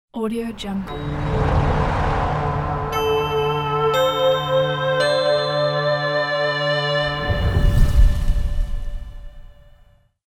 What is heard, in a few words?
arp news stinger